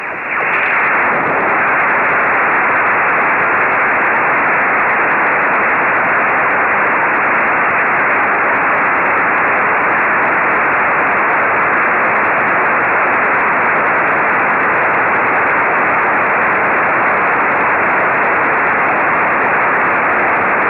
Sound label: gmsk beacon 145mhz835; xw-2d